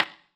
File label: surface wood table, dice; darkwatch